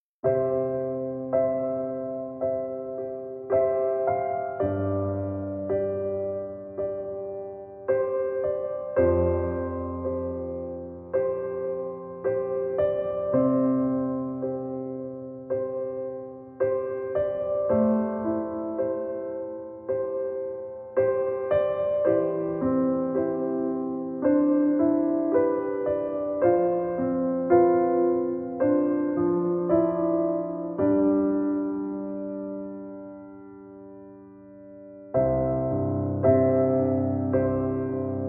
Relajacion Del Mar, Peaceful Piano, Relaxing Music Therapy; Guitarra-Cuerda-Piano-Ambiente